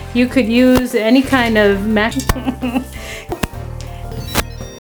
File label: wireless Sennheiser system static sounds; Sennheiser EW 112P "Camera-Mount' wireless; wireless (on-camera) Sennheiser lavaliere systems